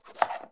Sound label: cut pomegranate